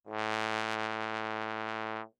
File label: Bass Trombone ff A2; MIS Pitches - 2014; Brass